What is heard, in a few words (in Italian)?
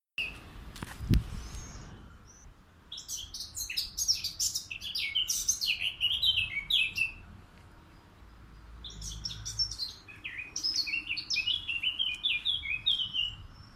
capinera canto